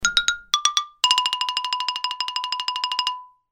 tieng lam dieu gi do bi mat ngai ngung

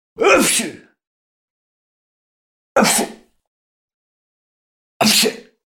am thanh hat xi hoi

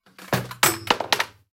roi do vat nho len mat ban

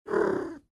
meow va gu gu cung mot luc meo triu men

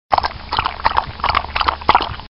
am thanh cho uong nuoc

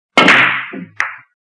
am thanh ban bida

